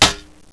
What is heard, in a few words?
metal, debris